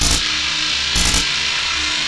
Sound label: charge loop; cstrike; weapons; gungnir